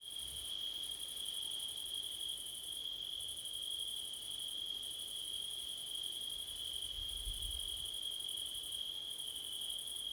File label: crickets wind september night; blumlein 24 - 48